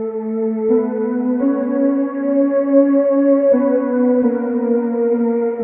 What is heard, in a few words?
Synth, Trip-Hop Loops; Sonic Foundry (Sony Creative Software); Rondo Brothers